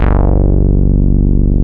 OP BASS; East West - Global Sounds; THE CAT

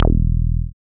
BASS, Tekno Industrial; East West

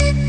plop; Amiga Soundtracker Sample Packs

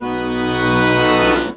ACCORD HITS; Best Service; World Colours

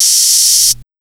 AMB 147 HHOP R, Big Beat Drumloops; AMBIENT 147; AKAI

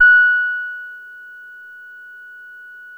F#6, Bass; E-MU Producer Series Vol. 6 – Analogia Project; Boom da Bass

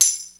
TAM; E-MU Formula 4000 Series Vol. 1; Hip Hop Nation; Bonus Perk

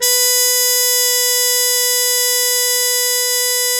MUTE B, Mood Trumpet; E-MU Formula 4000 Series Vol. 1; Hip Hop Nation

